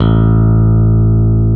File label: Basses, Roland